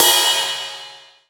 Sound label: RIDE, MONO RIDE CYMBAL